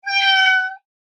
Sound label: cat cry